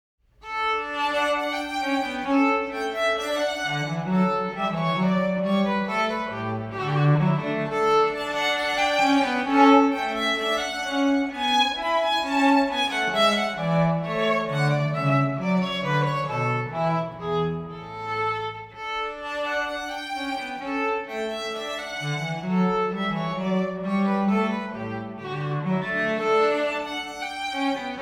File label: Strings Gavot